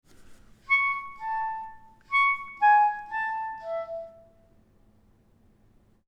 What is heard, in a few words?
melody 3rds 4ths